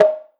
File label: Conga, 808; 808 Congas; Slate; Vintage Drum Machines Volume 2 808 Edition